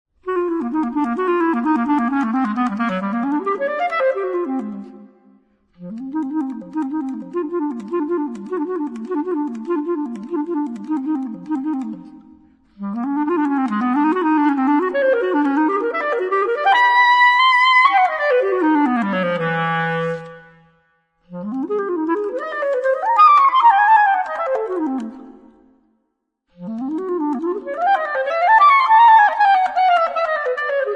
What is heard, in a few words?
jazz studies